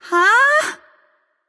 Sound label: confused light; smite; voicepack; hel